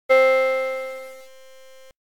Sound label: piano; envelope